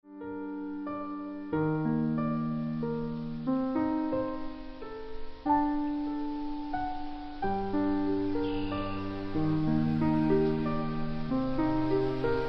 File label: Accompaniment